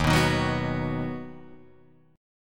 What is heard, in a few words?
Eb Minor Sharp 5th, down; Ebm#5 strummed; JGuitar